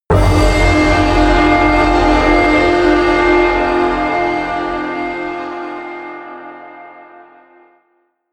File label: eastern hit